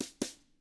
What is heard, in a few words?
BOSSA, Drums; ILIO; Double Platinum Drums 1